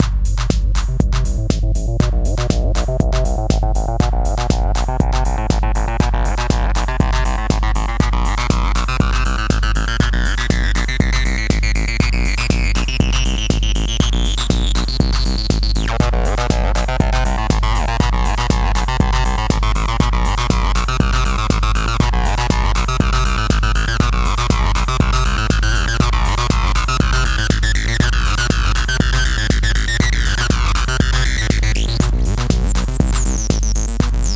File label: Distort Acid Test